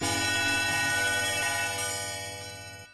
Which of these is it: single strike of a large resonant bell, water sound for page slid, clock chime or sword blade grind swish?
clock chime